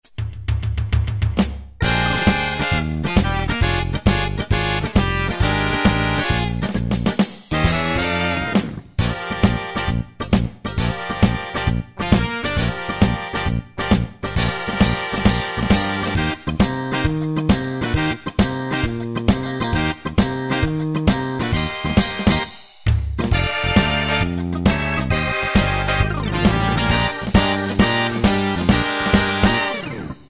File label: Blues Shuffle; Preset Styles